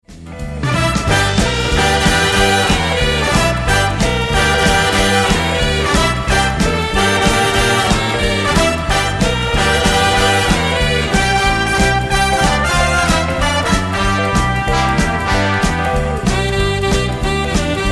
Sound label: shake rattle; jazz